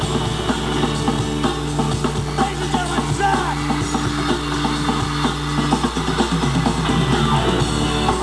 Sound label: solo